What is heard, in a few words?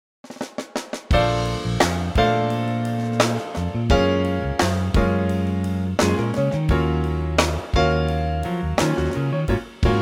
trio Bb